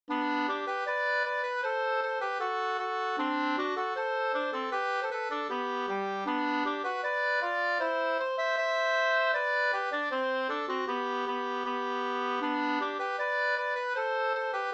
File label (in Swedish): schottis; Latschottis av Folke Andersson Sd 2st